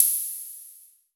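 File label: cymbal, Electro Harmonix DRM-16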